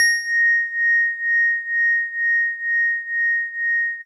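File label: House Organ DX100 A#0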